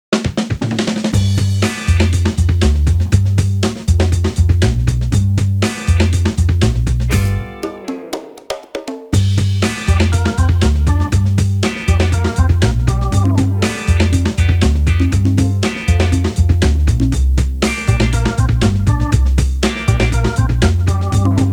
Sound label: bg music